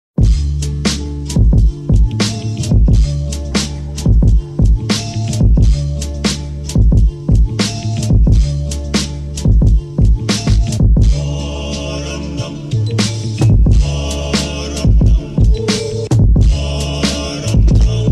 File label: War Drums